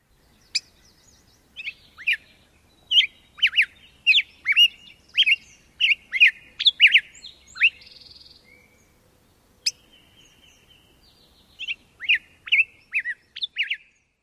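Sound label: chink call, Black-headed Grosbeak; leisurely song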